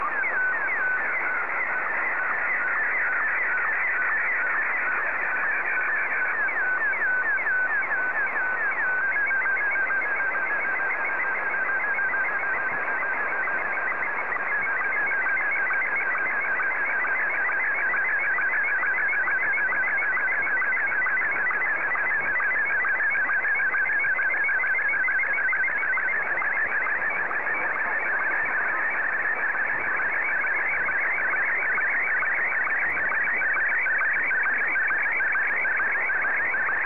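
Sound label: Martin 1, slow-scan television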